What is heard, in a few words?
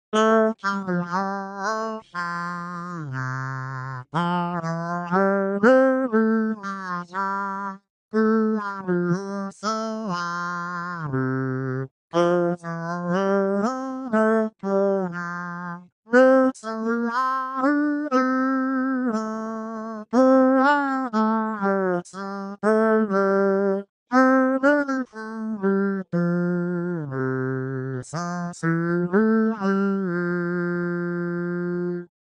Guitar